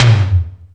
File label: TOM, House Side Of 2 Step; USB Soundscan vol.51; AKAI; TOMS